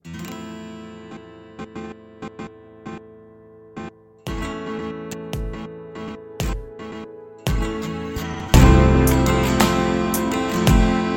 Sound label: Instrumental Version